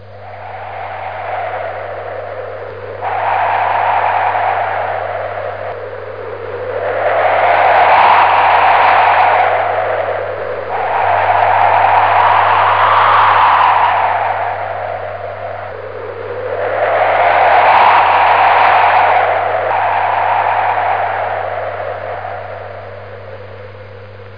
sturm

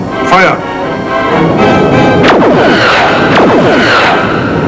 feuer

